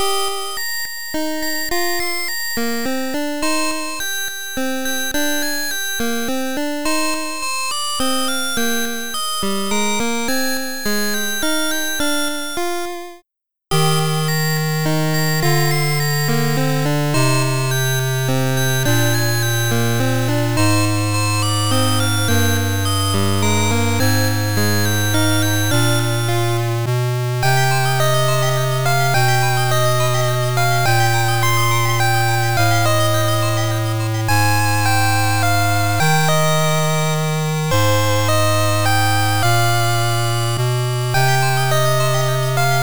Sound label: 8bit